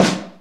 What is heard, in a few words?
RX7 HEAV SD; Crypton - DrumBase5000